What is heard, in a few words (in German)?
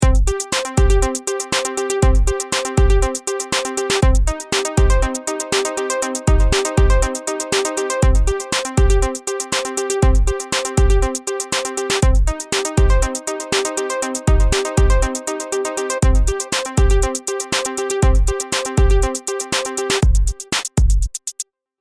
jingle synthi